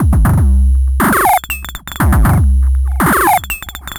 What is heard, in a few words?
Rolling Bubbles, Beats; Sonic Foundry (Sony Creative Software)